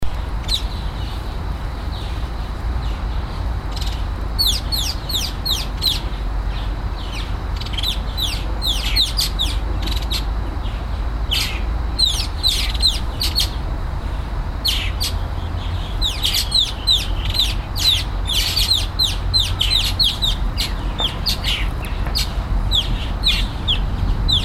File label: laughingthrush rufous necked Garrulax ruficollis